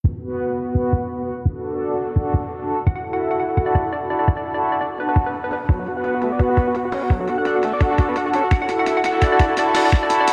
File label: Analog Dreams; Native Instruments